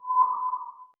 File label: dark completion sound